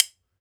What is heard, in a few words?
B. BONES, Celtic Percussion; E-MU Producer Series Vol. 7 – Old World Instruments